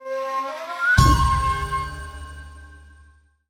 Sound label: JINGLE Item Get